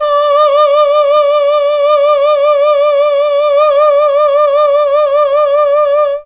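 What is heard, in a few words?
D4 chamber male, mellotron; CHMB MALE VC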